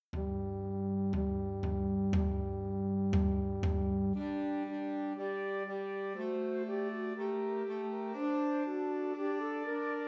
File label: Pat a pan, Christmas Trios for Flute Alto Sax and Tenor Sax